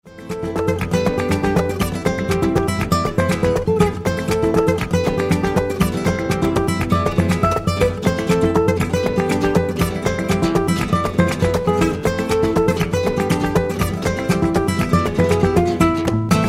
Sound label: gitarre flamenco